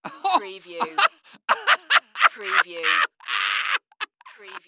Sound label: Laugh Hysterical Male; Sound Effects Bible